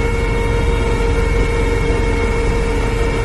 inside cell max stress loop mono right, Lynx internal audio loops